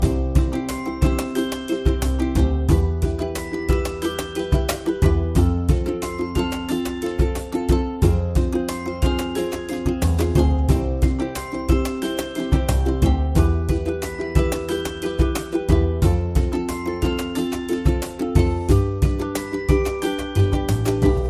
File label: Ukulele Song; Hold Music